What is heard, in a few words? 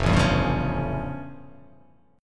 F Augmented Major 9th F